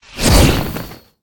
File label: swing; smite; heimdallr